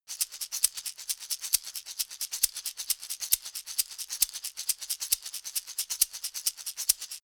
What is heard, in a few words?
shaker wooden fast long